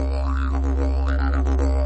didzherido dlinnaya vibraciya

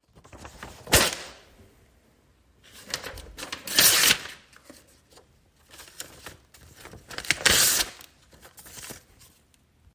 razriv bumagi

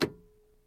tihij korotkij zvuk plastmassi

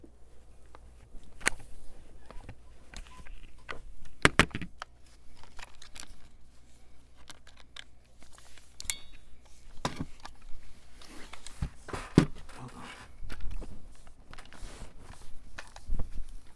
zapis sdelana s pomoshyu tascam dr 05x s moej vintovki lancer tactical lt15 airsoft rifle

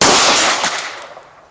prostoj zvuk vistrela pri strelbe iz 9 mm pistoleta v otkritom tire

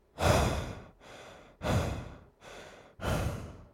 vzdihanie ustaloe muzhchini

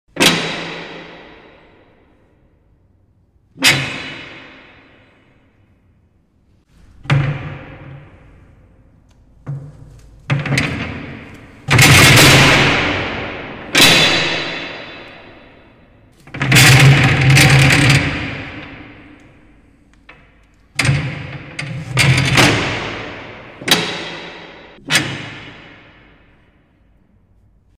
tyazhelaya dver tyurmi zakrivaetsya tyazhelim gromkim zvukom metallicheskoj dveri

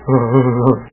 oznob muzhskoj

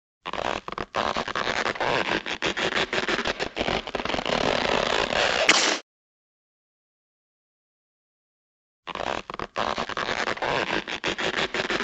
natyagivanie i vistrel iz rogatki

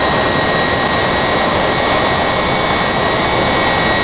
zvuk motora slishimij ot blizko stoyashego samoleta

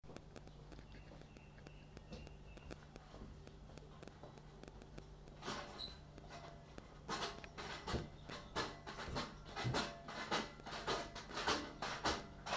kto to tashil ruchnuyu telezhku vniz po stupenkam perekladivaya veshi

